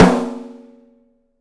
OPEN STEEL SN; 3D Drums

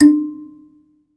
BASS KALI, Kalimbas; E-MU Producer Series Vol. 7 – Old World Instruments

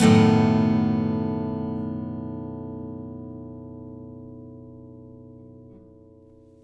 BARI CHORDS, Blues Guitar; ILIO; Fretworks

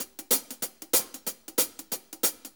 Shuffle HiHats; Sonic Foundry (Sony Creative Software) - Loops & Samples Drum Tools; Shuffles

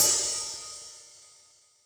H Opn, Hi-Hat, Yamaha DTXpress

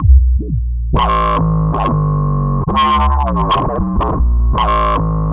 Future Bass; Drum & Bass; Fluid Dynamics

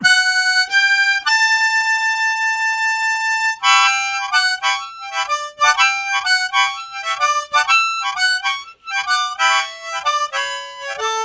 Falling Octaves, Harmonica Riffs; Zero G

